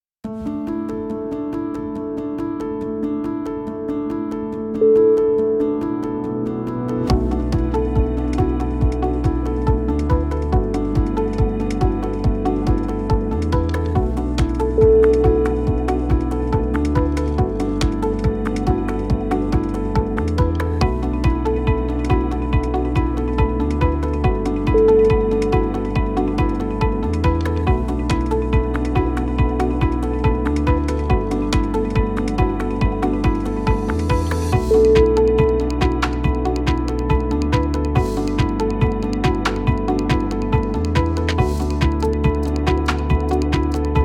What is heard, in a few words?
ambient tech